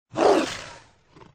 tiger

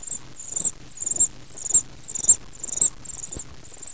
jungle; Notrium

